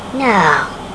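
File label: "noooh", exclamation; AUSRUF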